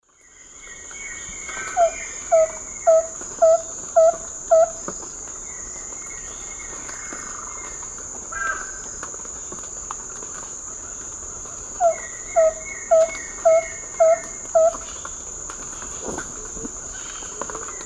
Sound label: Hylopezus fulviventris, song; S. America; Eastern Lowlands